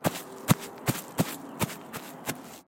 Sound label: walking grass with nature; soundscrate